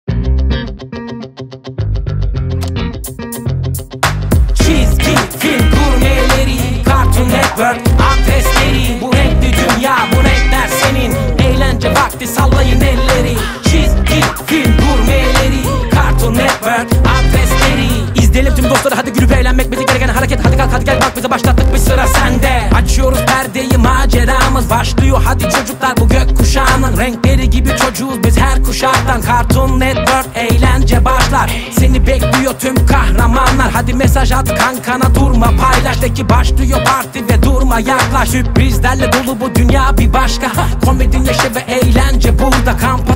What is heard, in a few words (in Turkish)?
rap sarki; cartoon network turkiye